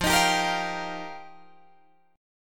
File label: F 7th Suspended 2nd Suspended 4th, Guitar Drop D